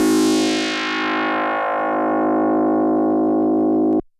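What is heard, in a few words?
DX100 D#0; rocket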